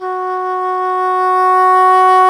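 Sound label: WND English Horn, Swell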